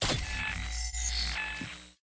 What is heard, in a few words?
Scanner; Game rip; MiSide